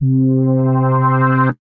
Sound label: organ synth; Synth Keys; 1999 Organ Synth; Ableton; Creative Extensions; Poli